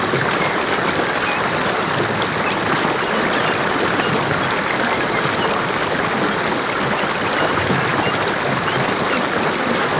Watermill Worked; Civ4 beyond sword; Resouces and Improvements

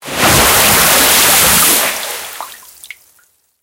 enter the water, tanks; engines; War Thunder

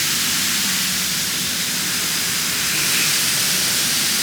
57mm rocket trail dist; Modern Warfare

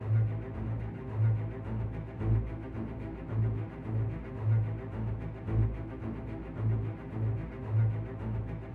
music interactive; World of Tanks

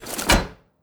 close rear hatch; Rust

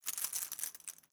chain belt; Rust

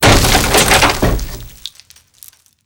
wd shutter detail; Modern Warfare

